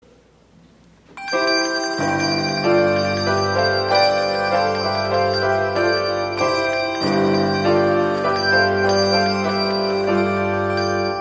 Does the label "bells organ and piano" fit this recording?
Yes